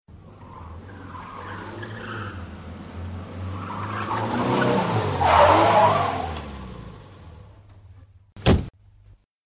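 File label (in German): Auto kommt quietschend bremst eine Tür zu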